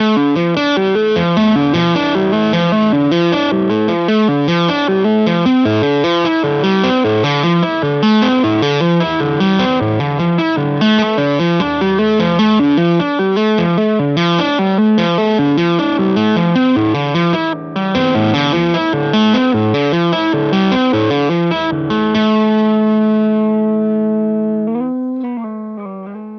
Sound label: Music Man Mariposa